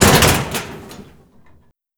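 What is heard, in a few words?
destroy metal locker small; Explosions; Call of Duty Modern Warfare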